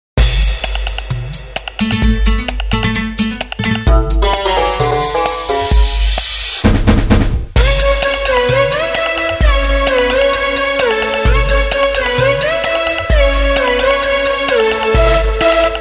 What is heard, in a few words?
Instrumental Tone; Krrish 3